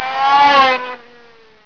Zip By; Zip By 6; ClipMedia™ Demo

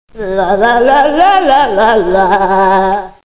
Frog; How Many Bugs in a Box

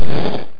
fireball; dosgames; roc

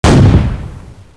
75mm Cannon; Close Combat

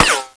metal, srifle; SINDEMO; weapons

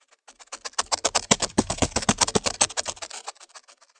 emu; Dorling Kindersley My First Incredible Amazing Dictionary

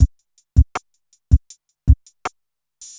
DRUM LOOP ambient 160

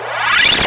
SHIELD; robotron

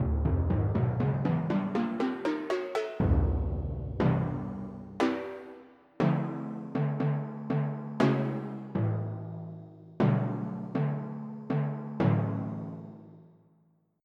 Timpani; FF7

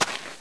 player dirt; Group Mapping Project

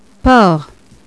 pig

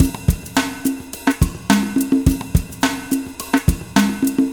drum; FUNKACID5

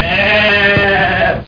GOAT; funnysnd

